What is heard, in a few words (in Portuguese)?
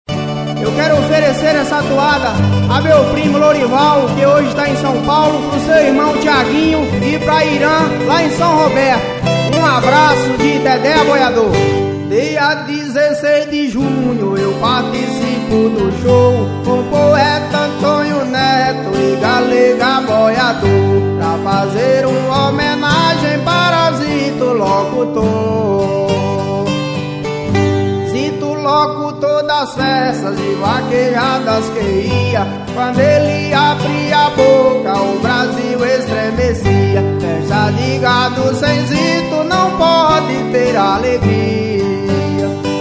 Aboiador